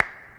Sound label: clap, hip; Best Service; Dance Mega Drums